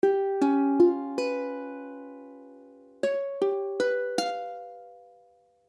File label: Cis7b5, ukulele; ukulele akordy; C#7b5; C# akordy (=Db akordy)